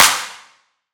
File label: drop clap